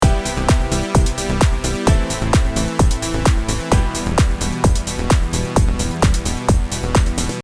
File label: EDM